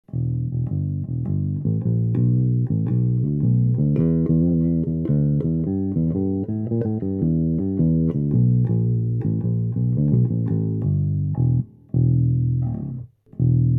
Mikro Star Bass EQ Bass Mid Mids Voll High Null Phat null mid voll